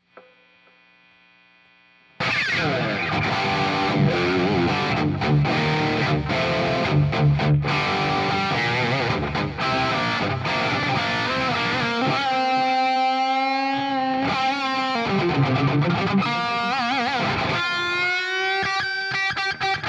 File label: guitar